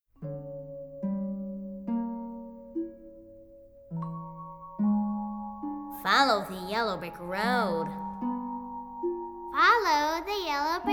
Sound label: (WITH VOICE); audition materials; kids cast; Wizard of Oz